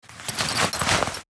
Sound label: Jacket pocket rustle rummage keys; foley